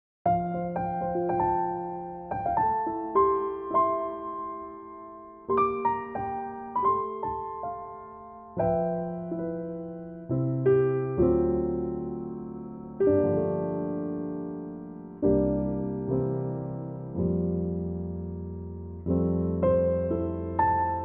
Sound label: F piano trak